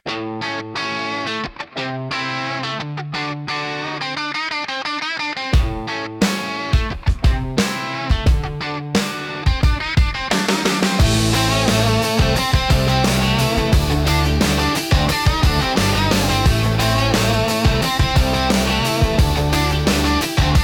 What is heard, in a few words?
hip hop